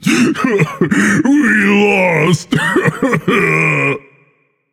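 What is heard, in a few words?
non-verbal boohoo; smite; atlas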